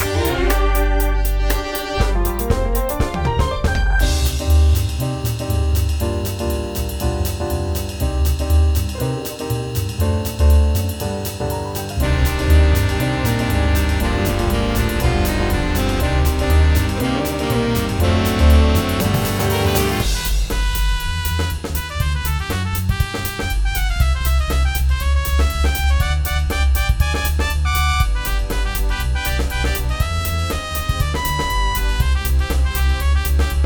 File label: night